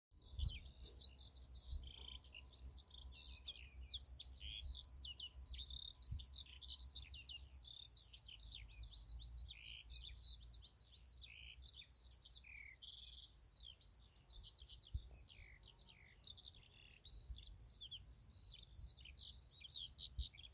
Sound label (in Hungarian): mezei pacsirta hangja